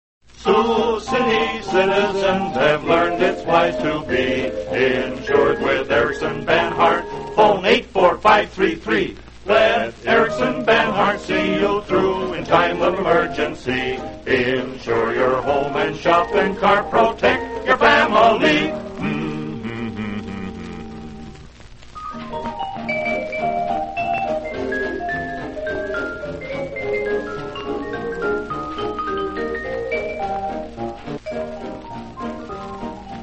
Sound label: radio jingles; erickson banhart insurance; allied radio artists